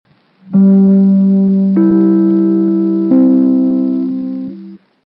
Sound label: NBC Chimes